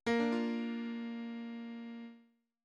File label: gong